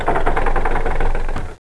gate open

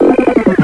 tritium, electronic sounds; Sound Effects for Multimedia - Volume 1

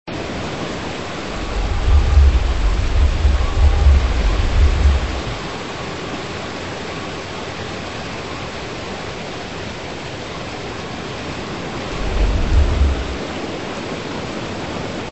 Earthquake Scape; red cross eru

